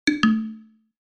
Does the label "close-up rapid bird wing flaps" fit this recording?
No